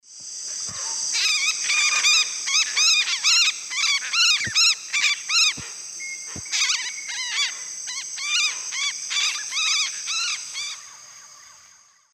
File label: Orthopsitta manilata, flight calls group; S. America; Eastern Lowlands